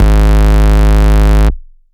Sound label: Bass, Root G#; Vengeance Samples